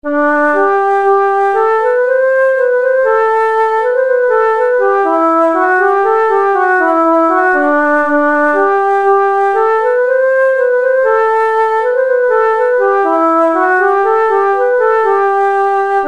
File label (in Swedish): Ganglat, nyckelharpa